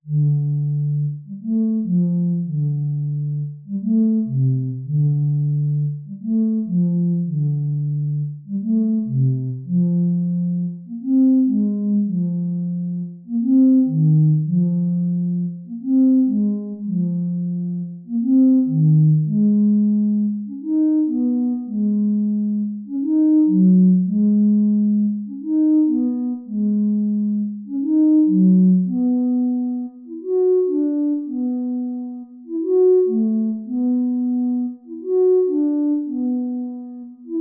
blow Bottle, roland ju 06 wind pack; SoundDiviner Project; Roland JU-06 Pack06; DigiD

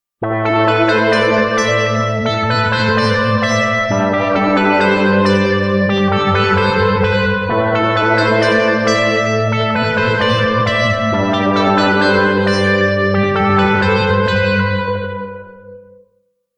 Bow Wow Falling

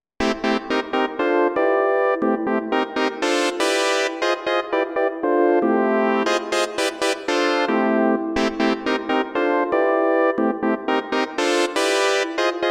Minor 7th Chord

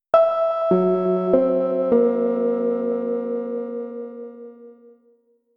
70s thriller

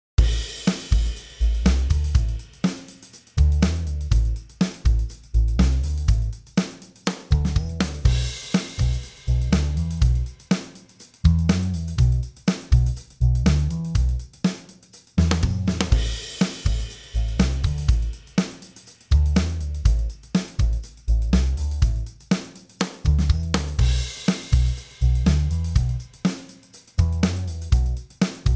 Electric Bass

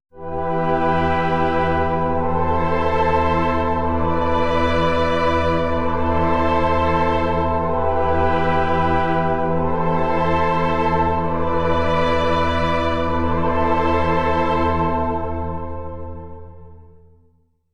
Swell Pad

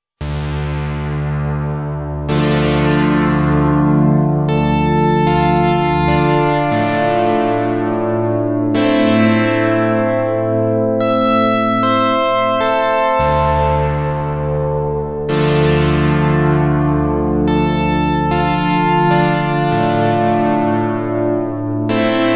Polysix Moon Sound

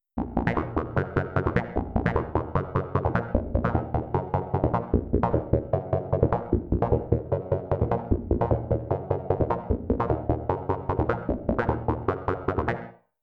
Blip

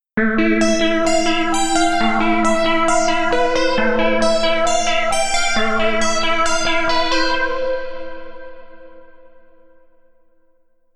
Shimmering Pluck

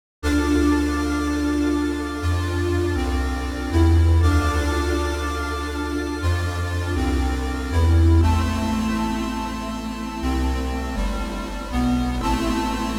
Laryngitis

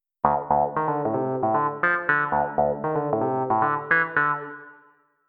Clavi Synth

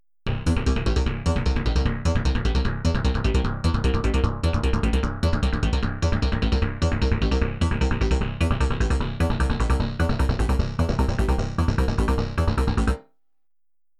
Blip

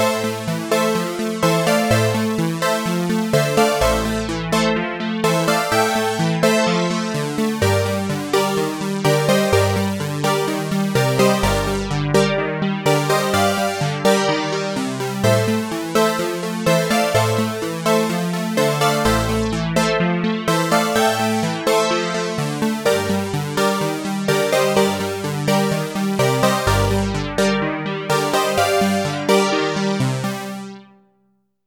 Phase Keys

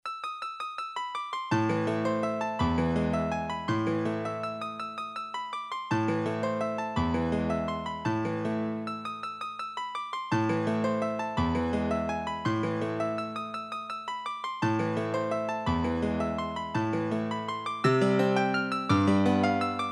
V-Piano

